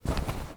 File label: sound bag; shadow over bishkek